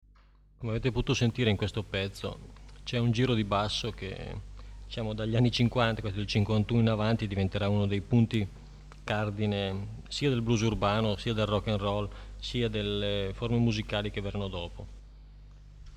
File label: Blues; Blues Workshop